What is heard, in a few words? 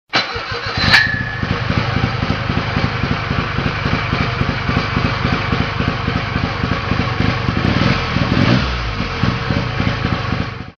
wankel, motos